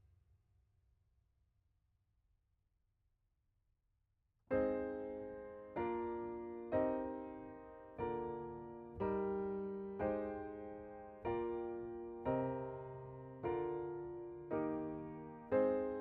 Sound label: Piano B